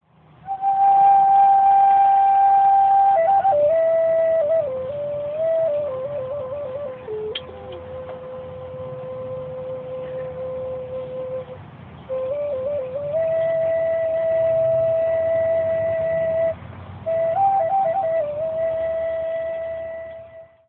cherokee flute; in memory of wes gibson in alabama

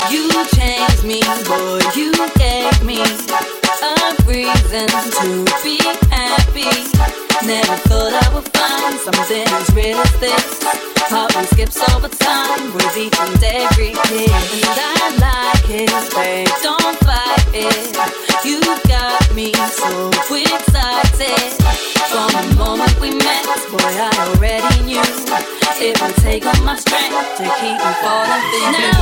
funky, hip hop ringtone

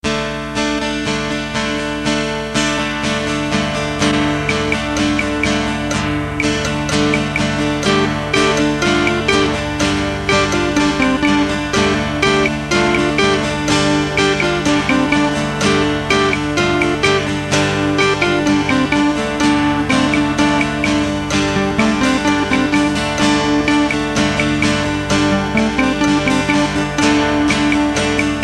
indian rain dance

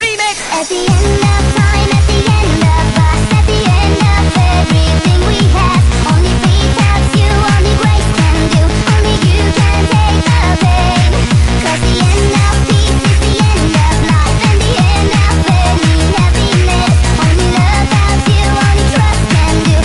disco

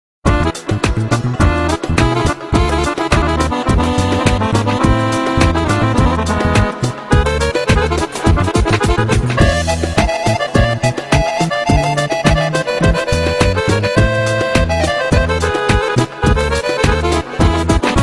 Bosnian Music

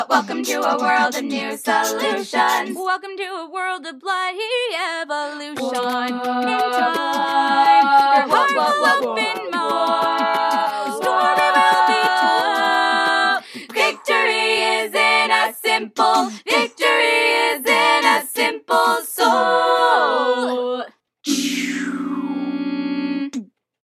A Cappella; RWBY

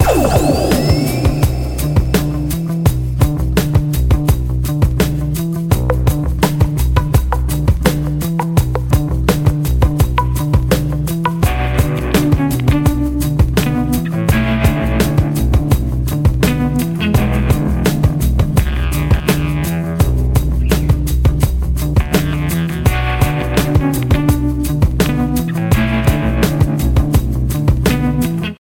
Game Menu

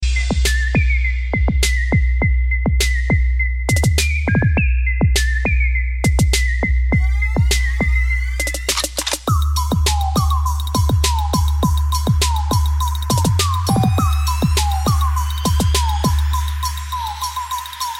Trap

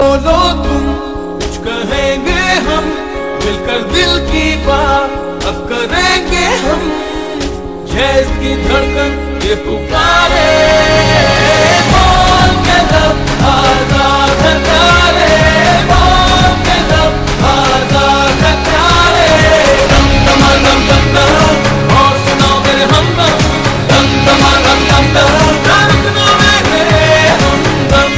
jazz; mobilink

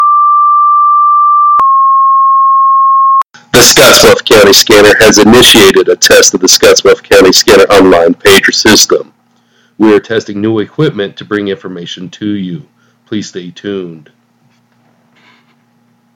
Is Calling Rap, ringtones

